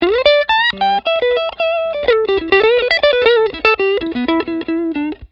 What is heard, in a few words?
TELE NECK LICK; t neck pickup